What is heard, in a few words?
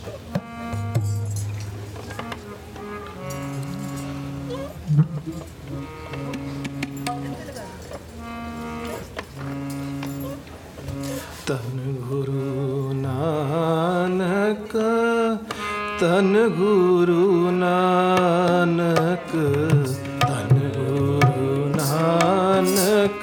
Kirtan; Rain Babeehaa Boilou Maeree Maaee; Dallas Samagam